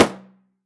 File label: SLAP, HAND DRUMS; SoundBytes - Early Patches